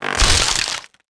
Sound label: wood fence common; World of Tanks Blitz